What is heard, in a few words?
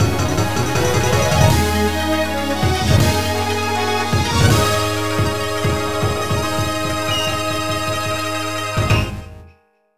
Clear All; Power Pro Kun Pocket 14